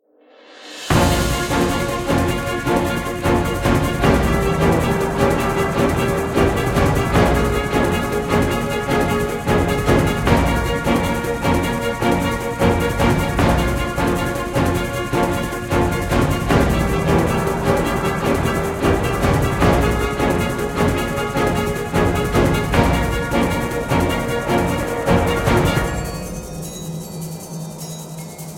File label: encounters loop